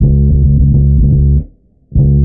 bass buzzed, Loops

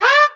"ah"

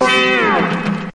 wibbly

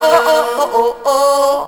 oh oh oh SUNG, Voice

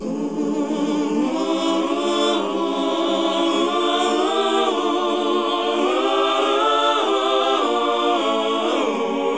voice, Sad

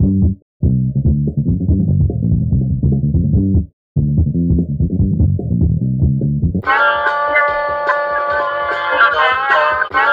horns, phonky loops

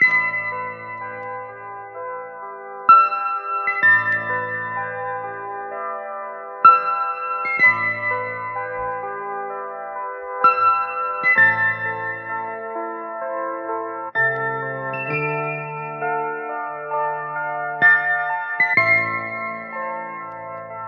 keys italian tragedy, sad loops

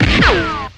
ricochet; Crash Bang Boom

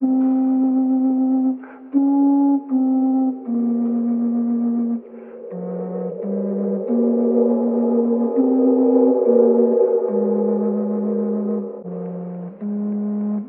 flute, Loops, Sad

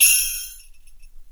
jingle bells

